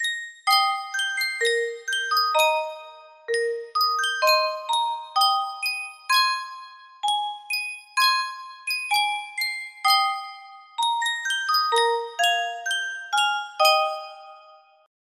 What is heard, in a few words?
Yunsheng Music Box, music box melody